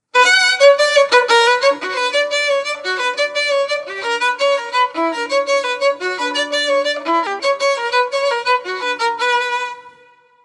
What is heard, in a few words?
Violin; All-West 2019; Bach Brandenburg No. 5